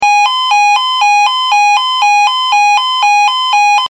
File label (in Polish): ton